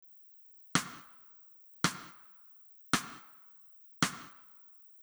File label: stick